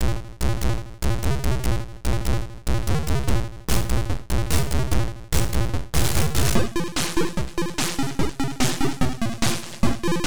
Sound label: happy ritmation